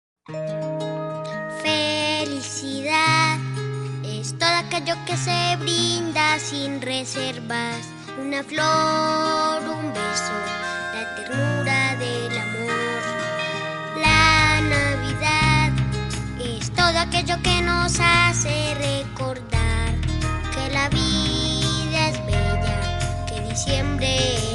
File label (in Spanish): JIngle Navidad; AguilaRoja 2023